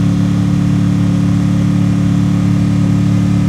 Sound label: Truck Explorer Loop; SFX Kit; Trucks